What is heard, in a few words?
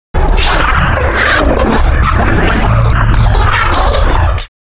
quake